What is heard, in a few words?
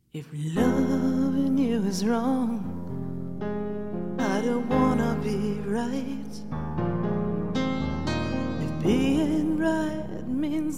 X12 Preamp; Madison